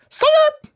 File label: Little Attack